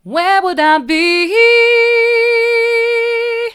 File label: where would i be mid, vocal